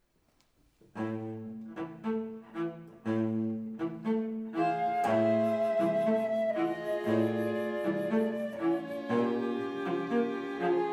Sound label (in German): das blaue klavier